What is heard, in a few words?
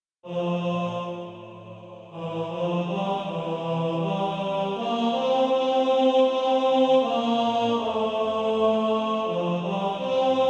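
Male Choir TBB 3-part, Practice Music; Baritone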